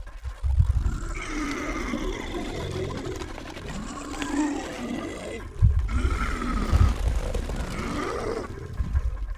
dimensional horror idle; Stellaris; guardians

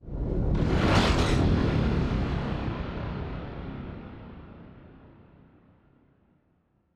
ship construction ship move; Stellaris; machineage

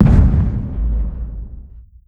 station explosion small; weapons; Stellaris

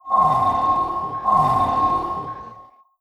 sensor ping; Stellaris

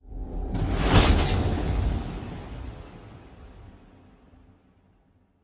ship construction ship move; Stellaris; machineage

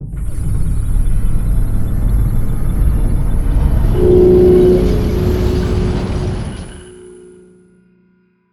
scavenger bot work start; Stellaris; distant stars

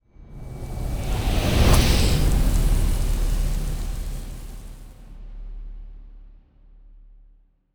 ship colony ship move; Stellaris; toxoids; ships

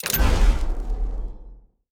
ui holograph unlocked; Stellaris; paragon

